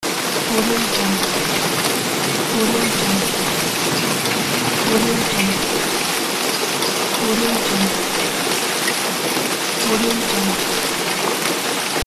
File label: rain hail shower